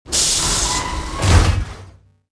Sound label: hatch close; atst